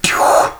laser gun

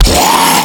zombi attack

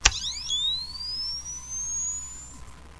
flash charge, camera; sven; cryoffear; weapons

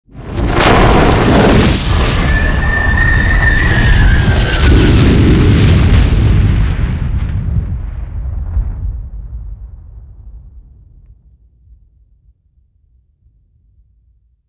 portal blast

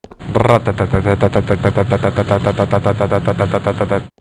ratatat; uboa

